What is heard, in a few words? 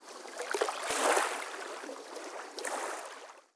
river lap; anubis